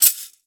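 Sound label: METALLIC SHAKER, JINGLE STICK; Voice Crystal - Steve Reid Definitive Percussion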